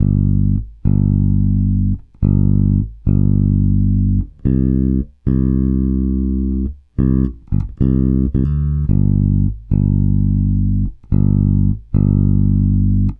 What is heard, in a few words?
Country Bass Verse; Digidesign; Pro Tools Essential Loops